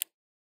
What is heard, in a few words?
click